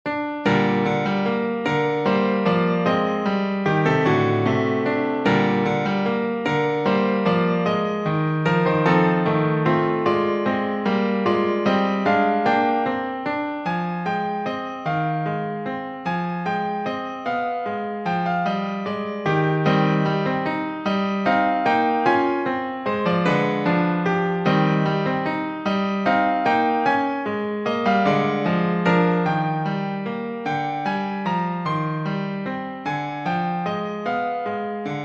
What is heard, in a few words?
winter waltz